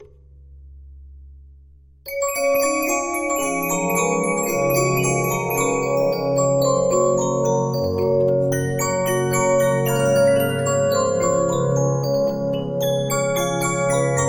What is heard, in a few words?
Regina, 15inch disc musicbox